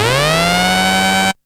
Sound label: FX